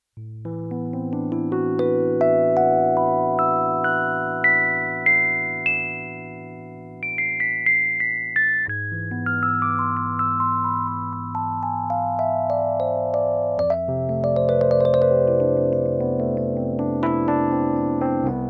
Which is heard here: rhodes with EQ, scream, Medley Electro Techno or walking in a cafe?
rhodes with EQ